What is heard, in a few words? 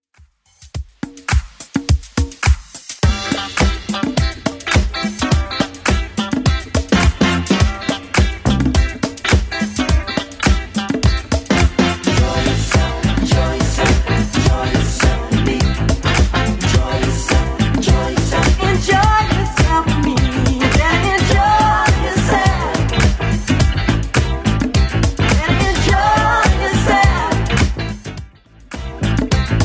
70s Club Edit 105bpm; remixplanet